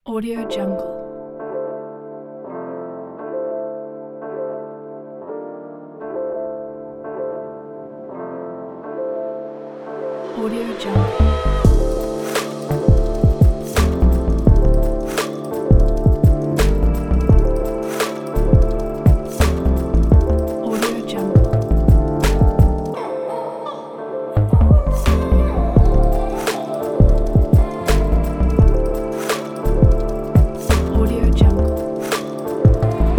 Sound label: fresh summer hiphop music kit